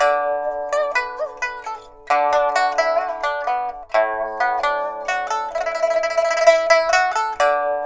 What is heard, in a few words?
PIPA, 90; INIS - Opium